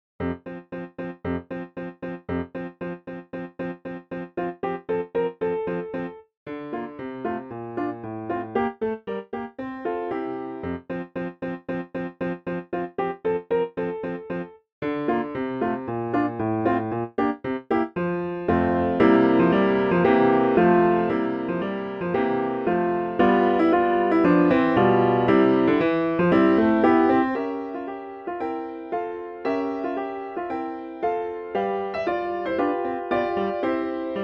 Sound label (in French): tambourin